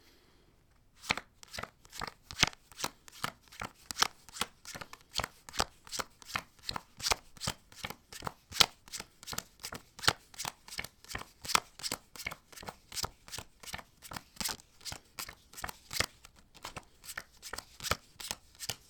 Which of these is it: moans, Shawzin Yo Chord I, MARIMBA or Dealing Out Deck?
Dealing Out Deck